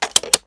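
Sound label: sniper reload mag release; gmod; iw8; xmike109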